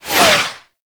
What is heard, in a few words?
tank missile barrage whizzby; Enemies; Abilities; Lasrian Tank; Warframe